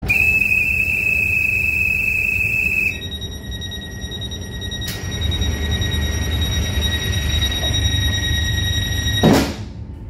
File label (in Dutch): Deuren Sluiten